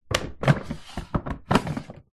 household washing machine detergent drawer tray slide open close; zapsplat